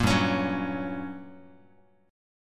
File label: G Minor Major 7th Flat 5th, G, down, Guitar, 4A 4E 5A 5D 5G sharp 6C sharp; strummed; JGuitar